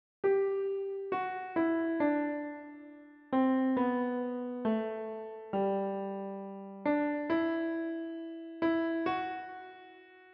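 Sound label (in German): Joy to the world, Klavier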